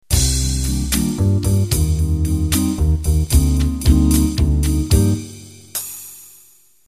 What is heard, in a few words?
Jazz Vox Intro